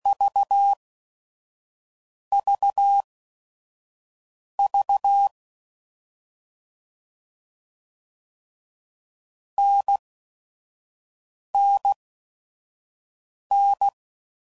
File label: CW Code Groups 5WPM, Difficult Combos; HamRadio; Morse Code; Mixed; Combinations